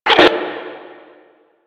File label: Static; Warframe; Lasrian; Lasrian Enemy B